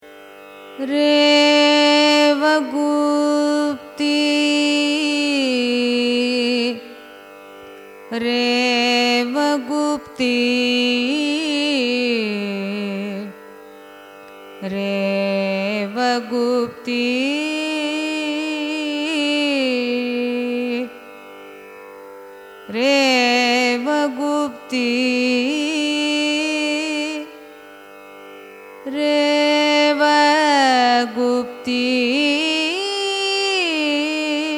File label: raga revagupti signature; carnatic music